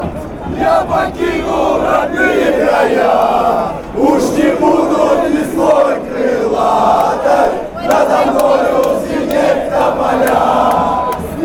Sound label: cska chant, fan chants